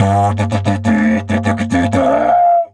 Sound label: G1 wild, didgeridoo; Big Fish Audio - Didgeridoo and Other Primitive Instruments